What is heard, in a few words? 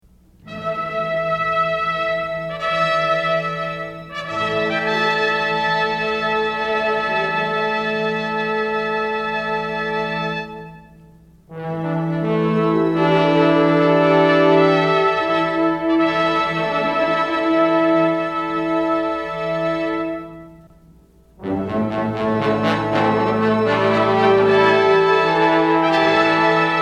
Fanfare opening